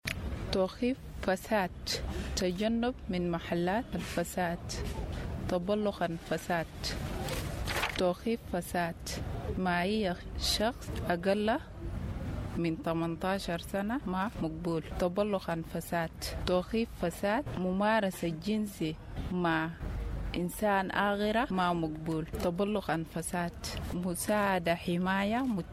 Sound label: Radio jingle; ROA